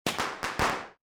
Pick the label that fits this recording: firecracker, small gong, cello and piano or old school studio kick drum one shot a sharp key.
firecracker